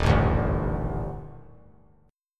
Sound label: Eb Major 7th Flat 5th Eb 3C 3F 3A 3D; EbM7b5; strummed; JGuitar